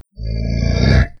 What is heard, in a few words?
attack